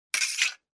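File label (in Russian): скользящее движение клинка о клинок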